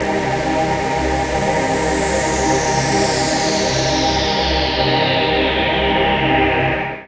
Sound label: VOICE PAD; Best Service; XX Large; Pads